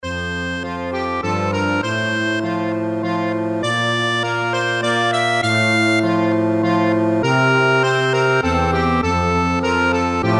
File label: minuet, accordion; bach